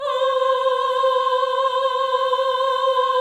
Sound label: VIB F C5B -L, Symphony of Voices; Spectrasonics